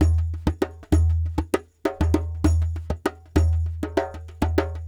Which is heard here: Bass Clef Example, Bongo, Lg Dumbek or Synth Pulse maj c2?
Lg Dumbek